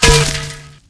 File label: bush; Mafie 1